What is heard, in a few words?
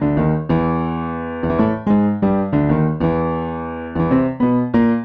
jazz keys AcPiano 95 B-line n Riff E